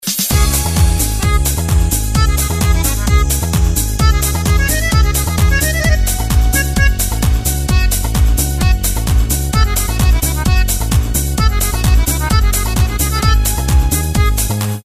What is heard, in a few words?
Melody, RingTune